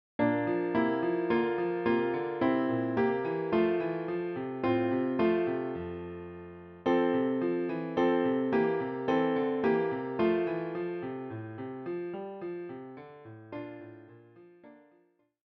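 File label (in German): Leise rieselt der Schnee Klavier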